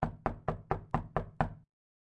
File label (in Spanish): toc puerta